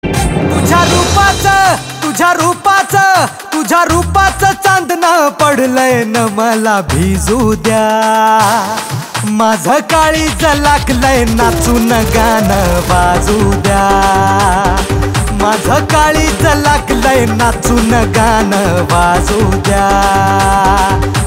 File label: ringtone